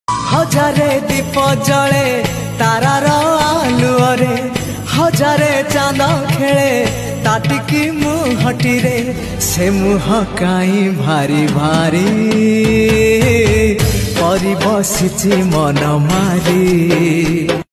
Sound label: Odia Jagannath Bhajan